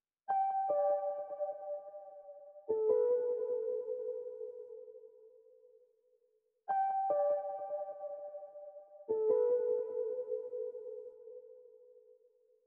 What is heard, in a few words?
150 BPM G Maj Wurli Synth; Reverse; Stems; Cymatics; 2022 Melody Collection; Wishing Well